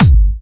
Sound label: Harder, kicks